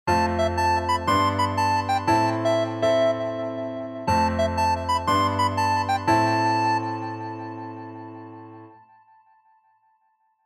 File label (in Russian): korotkaya melodiya veseloe otkritie animirovannij fonovij zvuk igri